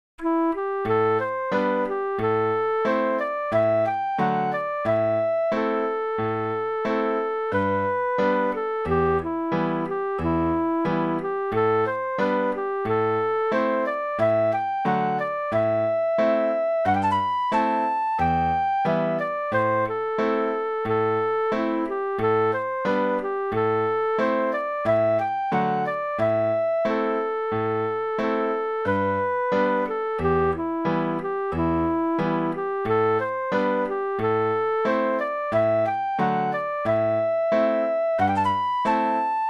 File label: Manassas 45bpm